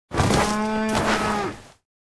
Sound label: cow pain; anemoi